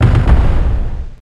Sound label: Belly Drum